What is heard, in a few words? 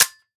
empty; mg42; svencoop; ins2